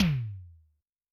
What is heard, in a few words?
Electrc F, Tom, Yamaha DTXpress-II